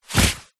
foley clothes running shorts polyester single leg move walk movement